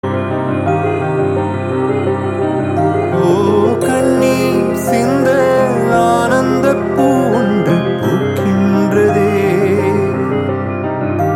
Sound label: Theme BGM Ringtone; Thiruchitrambalam